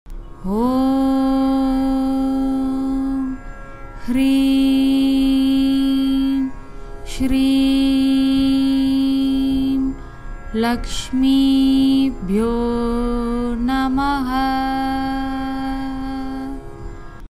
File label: Lakshmi Mantra